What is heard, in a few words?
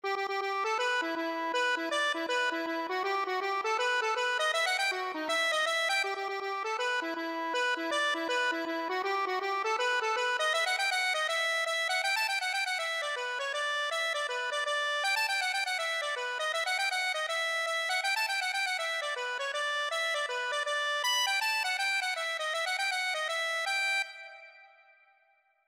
accordion